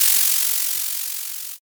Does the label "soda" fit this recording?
Yes